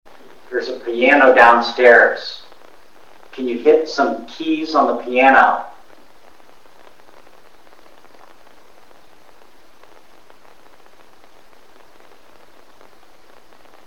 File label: Piano